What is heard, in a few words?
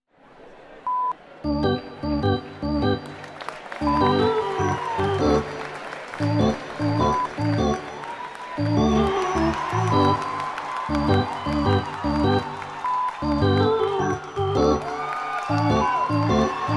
baseball Music Crowd